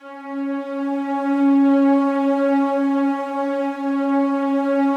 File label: slow strings C#3, Yamaha VP-1; 90 s Sample CDs; Music Store